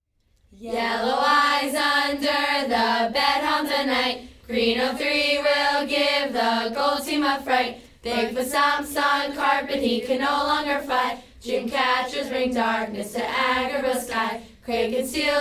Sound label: cheers